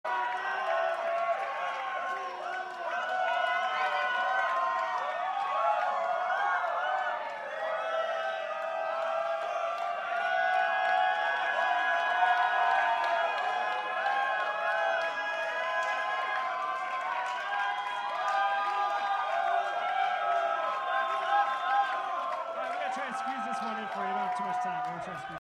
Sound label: Crowd Banter